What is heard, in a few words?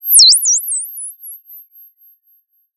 Sound of a bird tweeting nicely